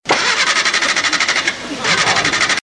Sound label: engine sputtering and cranking; car